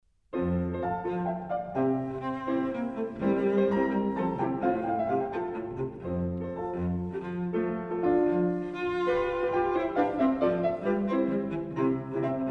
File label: cello sonata; franck